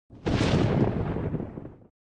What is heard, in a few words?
thunder